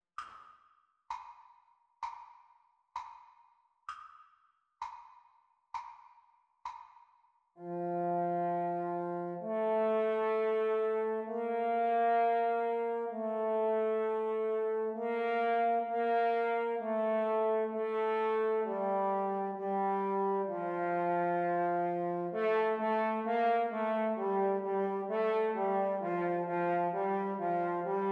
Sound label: French Horn Duets Playalong Practice